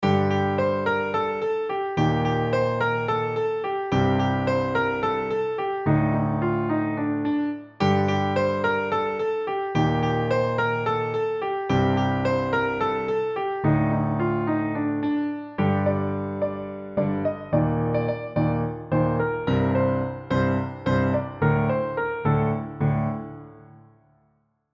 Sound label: solo, piano